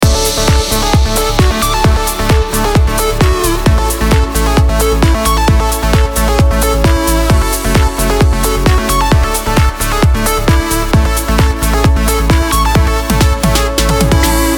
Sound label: Summer Deep House Loop